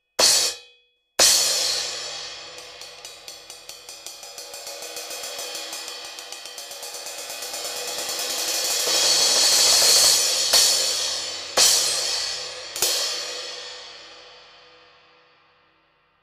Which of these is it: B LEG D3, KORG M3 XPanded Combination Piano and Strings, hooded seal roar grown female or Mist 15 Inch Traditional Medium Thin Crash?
Mist 15 Inch Traditional Medium Thin Crash